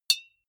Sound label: deodorant hit hard, metall; maschinen; soundpool